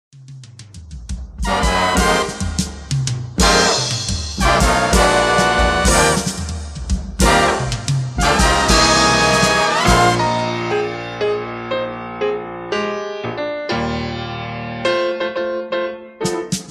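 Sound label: estradnyiy orkestr, variety orchestra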